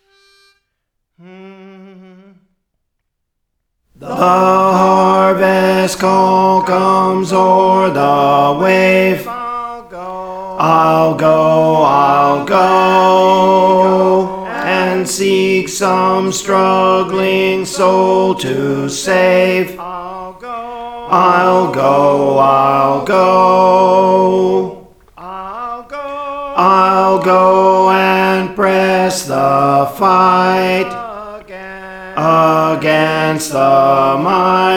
Bass; Praise For The Lord Training

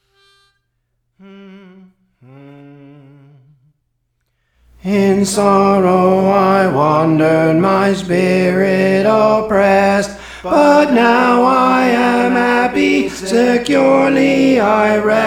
Tenor; Praise For The Lord Training

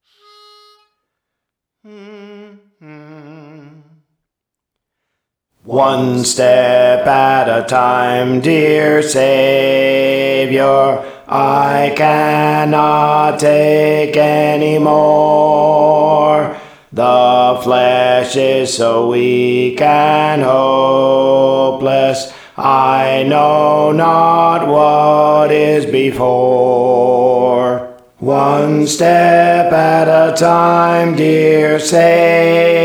Alto; Praise For The Lord Training

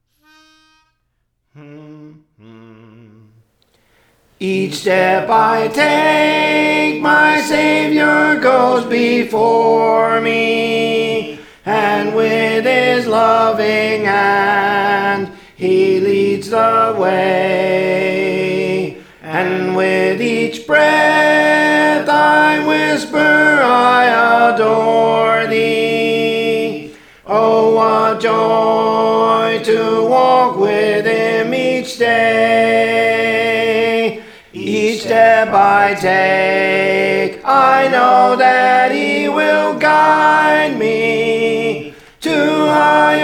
Tenor; Praise For The Lord Training

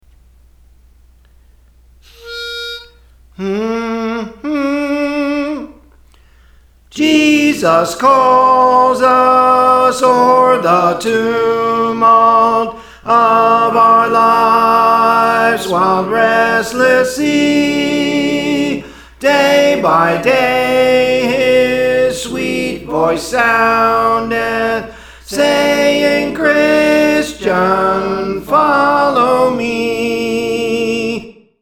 Soprano; Praise For The Lord Training; Jesus Calls Us

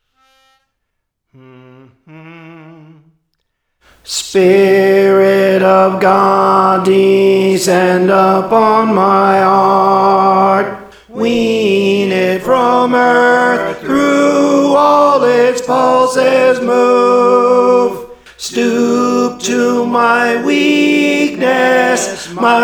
Tenor; Praise For The Lord Training

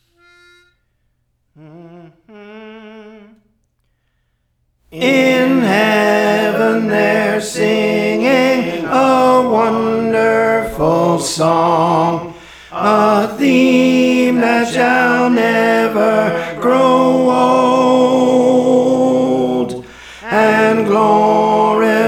Tenor; Praise For The Lord Training

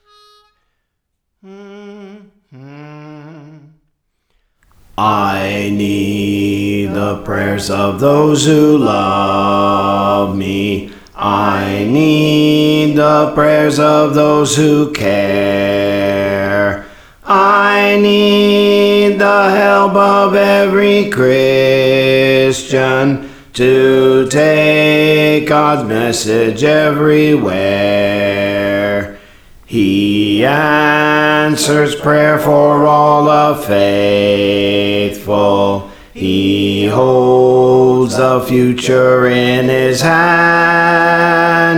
Our Heavenly Father Understands, Bass; Praise For The Lord Training

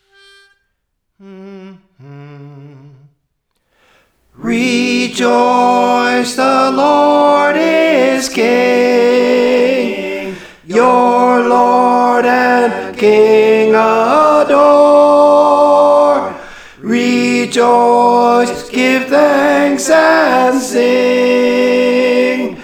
Tenor, Praise For The Lord Training

